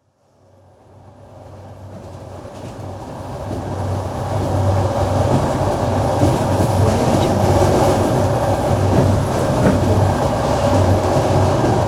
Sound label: train interior